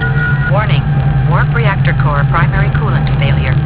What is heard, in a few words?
coolant